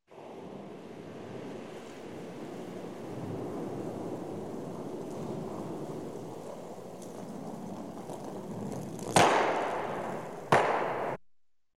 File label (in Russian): kyorling kamen skolzit i udaryaetsya dva stolknoveniya